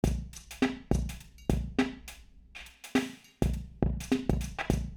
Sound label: drums centre; Heldenberg; 6m